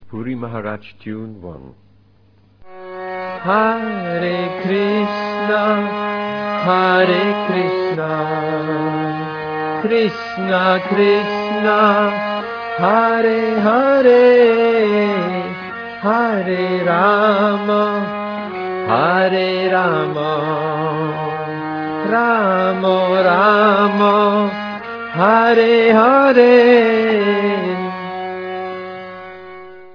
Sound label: tune